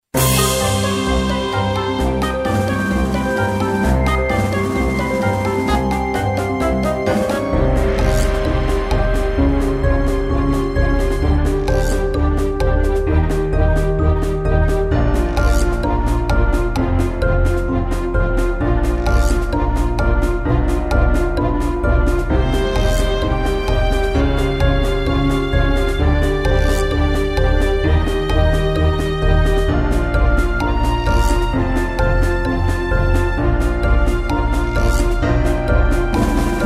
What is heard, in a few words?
soundtrack